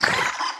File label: wriggler warcry; enemies; Factorio